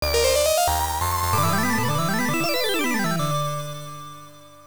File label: Event Item Getting Jingle; Valkyrie no Densetsu; TurboGrafx-16